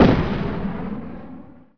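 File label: explode; machines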